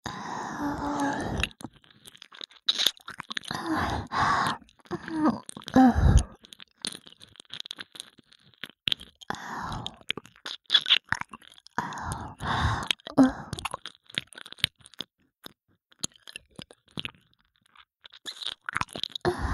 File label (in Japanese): 右耳舐めループ; 通常耳舐めver